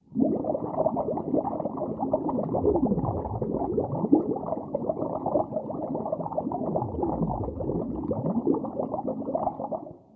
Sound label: Underwater Bubbles